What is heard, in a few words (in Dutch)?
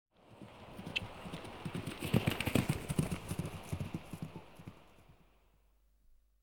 Paard Gallopeerd voorbij; KMP Film Video Sound Effects